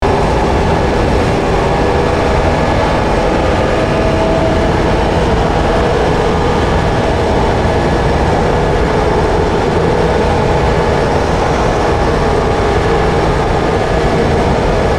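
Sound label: Ambience Fan Drone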